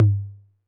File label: Tom Low 808 Sat, Saturated